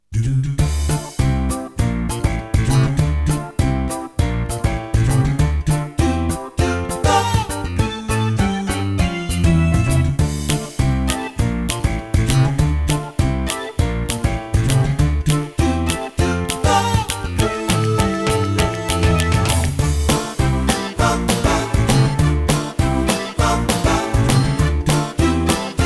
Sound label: Swing Vox